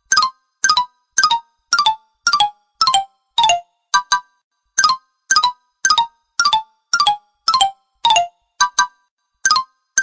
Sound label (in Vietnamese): tin nhan sms; wallander